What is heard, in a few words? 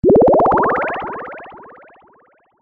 multimedia game sound positive action tone